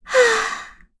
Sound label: Vox Sigh; en; voices; heroes; Yuria; kings raid